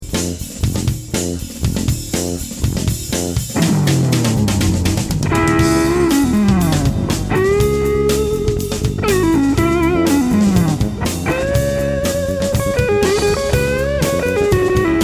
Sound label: Blues Rock